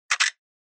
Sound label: Camera Compact Shutter